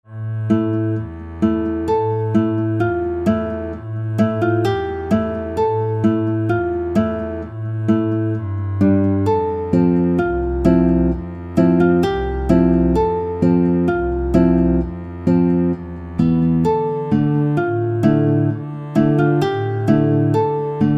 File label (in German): Cafetitelmusik Hintergrund mit Hall